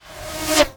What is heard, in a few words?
medieval scythian recurve arrow approach; still north media